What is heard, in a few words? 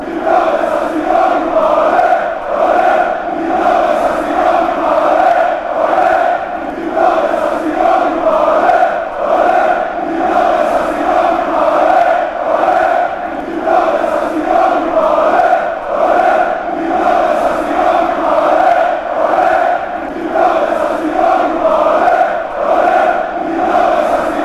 away win fanchants; in rome